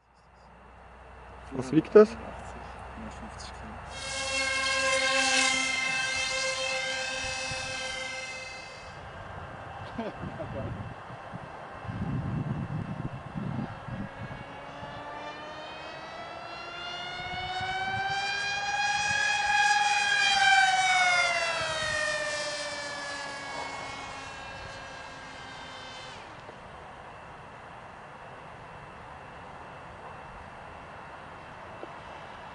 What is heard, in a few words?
Twin Jet, modellbau